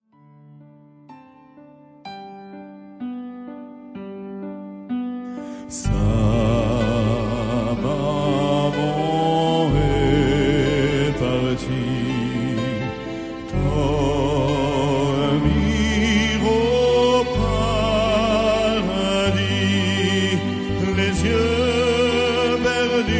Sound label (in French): lenfant au piano